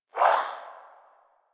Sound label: Swing, Melee; Warframe; Weapons; Modular Melee